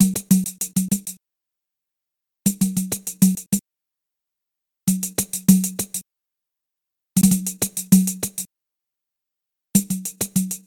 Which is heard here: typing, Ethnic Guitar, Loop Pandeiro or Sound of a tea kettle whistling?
Loop Pandeiro